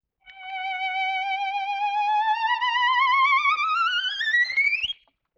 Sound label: Violin Glissando Slide; Vintage Cartoon; Musical Elements